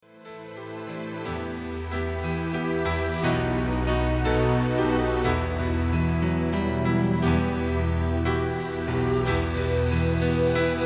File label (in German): Panfloete